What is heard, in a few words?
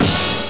HIT